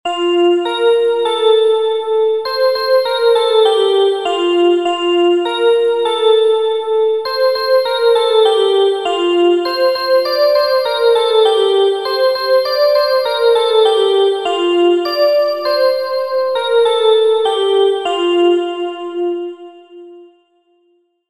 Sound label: song tune